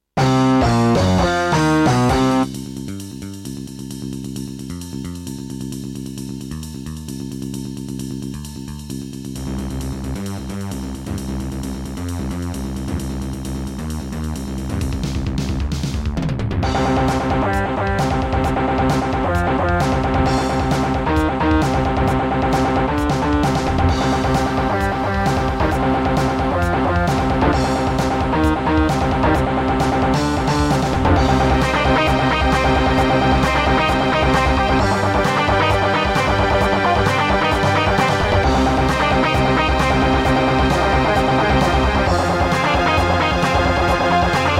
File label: Gravis UltraSound 1MB Rev.3.4; sigil doom episode 5